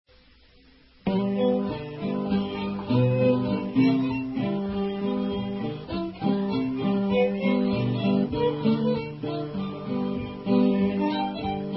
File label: blues